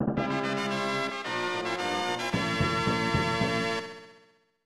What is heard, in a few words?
Victory!; Paladin's Quest; Lennus; SNES